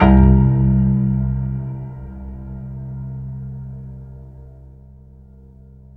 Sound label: PIANO C2; Best Service; Advanced Media Trax Modern Composer; PNO HAMON